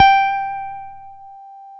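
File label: jazz guitar; Univers Sons; Jazzistic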